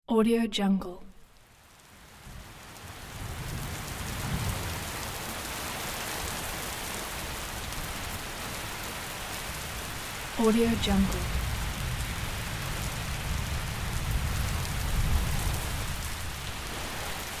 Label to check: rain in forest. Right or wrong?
Right